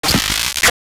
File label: Tech, Weird Side Samples